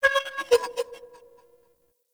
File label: Vocalized Metal, Asteroid; Warframe; Grineer